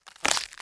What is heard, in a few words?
BREAK Squishy; stereo; Universal Sound FX; BREAKS & SNAPS